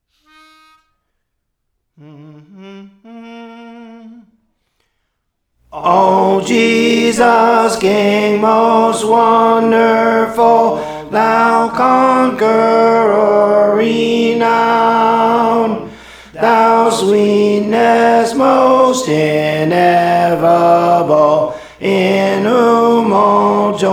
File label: Tenor, Songs; Praise For The Lord Training